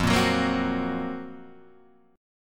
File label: F sharp Major 7th Flat 5th F